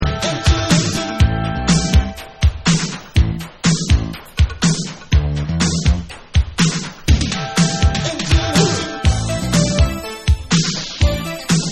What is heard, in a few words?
Vocal Extended Remix